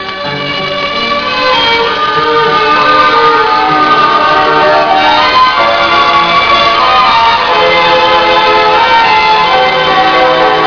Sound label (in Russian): Соло; Тишина